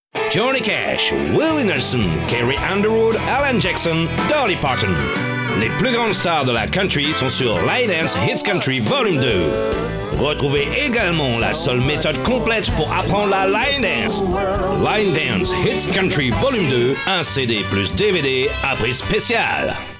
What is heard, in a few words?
line dance mix